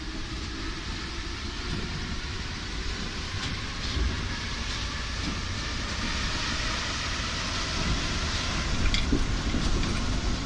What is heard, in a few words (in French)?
acceleration, Metro